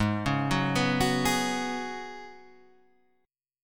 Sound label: F sharp Suspended 2nd Suspended 4th G sharp